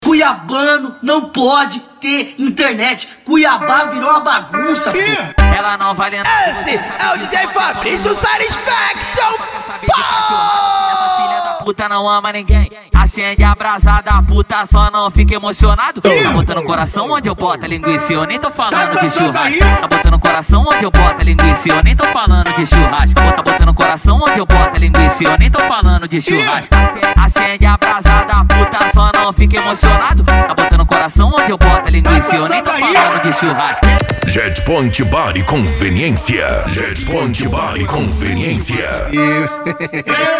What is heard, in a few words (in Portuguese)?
funk ritmado